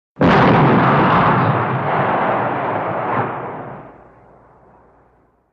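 Cannon Fire Single Shot, modern artillery exterior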